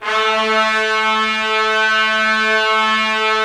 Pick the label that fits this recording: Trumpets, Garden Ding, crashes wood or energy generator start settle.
Trumpets